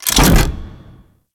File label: Extending Bridge Stop; Warframe; Corpus